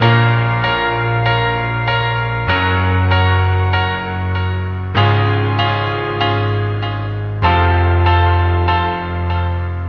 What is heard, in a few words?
piano, sad loop